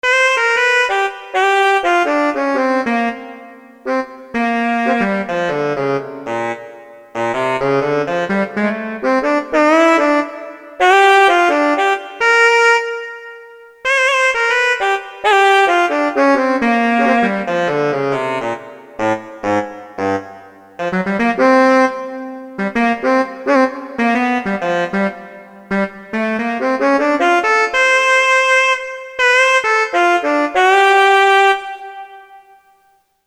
SAX, Korg DSS-1; Brass & Sax

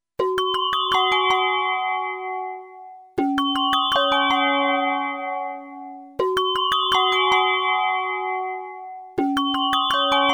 Cup Gong Rack Bell Kalimba Wind Gong Slit Drum

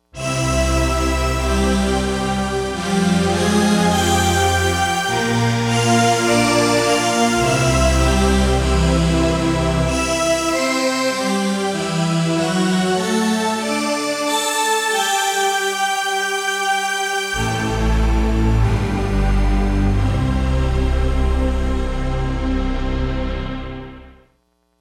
Breath octave; Digital Synthesizer

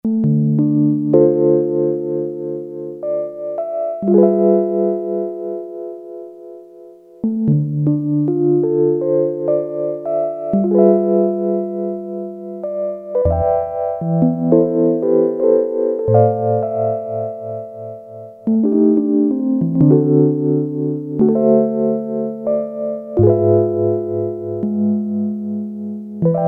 MELLOW RHODES, Roland Jupiter-8; Factory patches

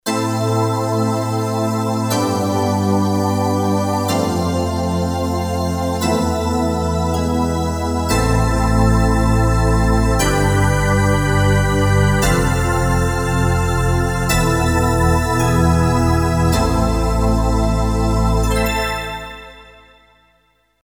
Stacc. Heaven, Roland JD-990; Vintage Board

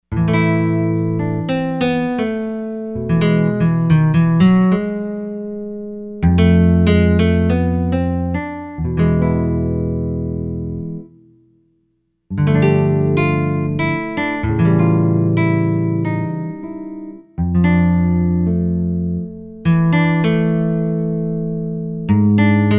Nylon Gtr

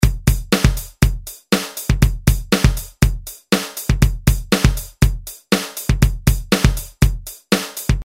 8BEAT3, Roland R-8

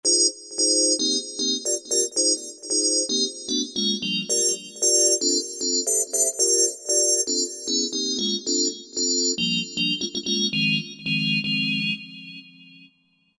Roland JV-2080 Mental Chord

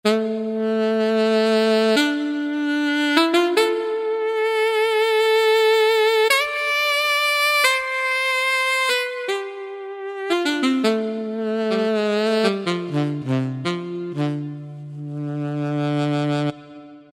Alto Sax, Yamaha PSR-3000; Factory Voices; Saxophone

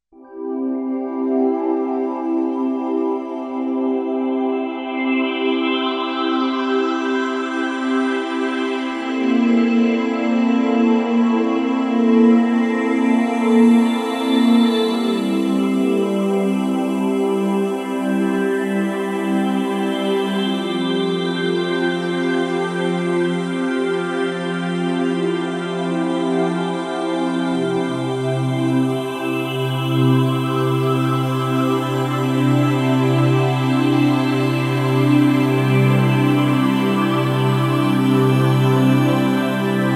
Millennium Pad

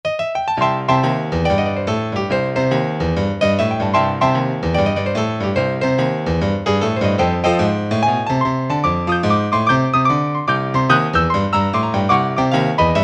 Grand Piano

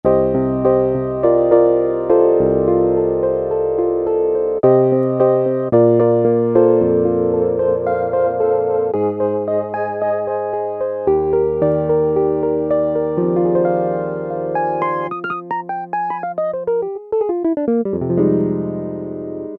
Elk-Uhh EP, Alesis Z4 Vintage Keyboards

